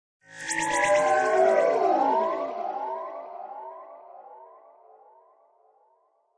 Strange, Creepy strange background tone excellent for evoking mystery and fear.; Strange Tone Sound Effects